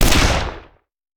Rifle; Sound Ideas; Guns

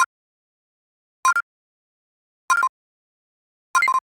Digital Click Neutral

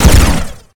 Gun Assault Rifle Power Shot, Weapons

